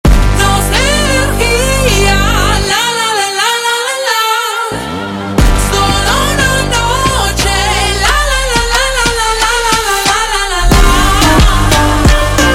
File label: Funk super Slowed